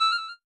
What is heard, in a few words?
clarinet zero, keytone; SKY IM-A730S-VEGA-S